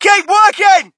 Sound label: crowd, shout; Mike Tyson Heavyweight Boxing